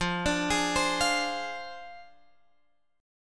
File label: F Minor 6th F down Guitar Drop D